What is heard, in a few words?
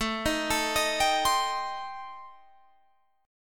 C Minor 6th A